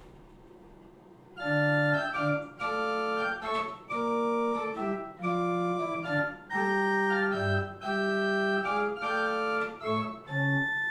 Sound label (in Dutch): siciliano, orgel; Lheebroek; Drenthe; stanley